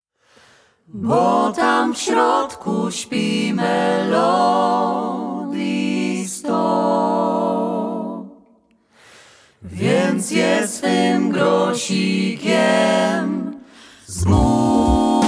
vocal group feat. organ trio

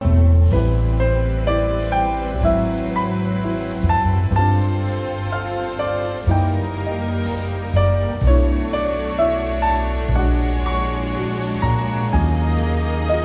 sketches for bass orchestra

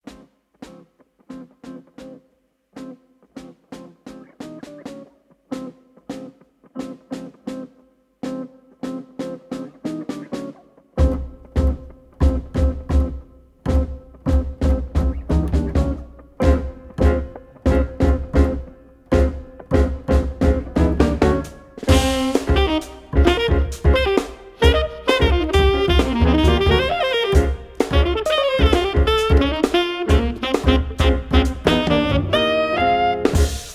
trio

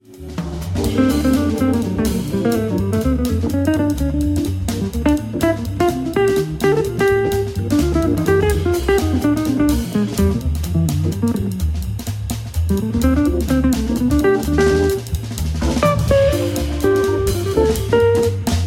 trio, organ

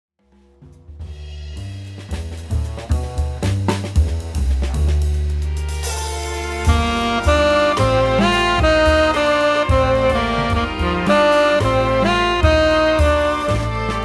trio

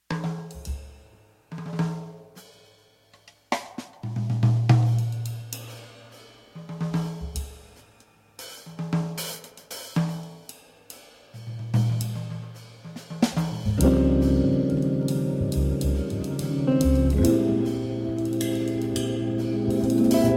trio, organ

